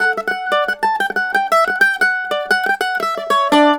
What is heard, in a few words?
MANDOL 120 D; USB Soundscan vol.42; Celtic Flavours; AKAI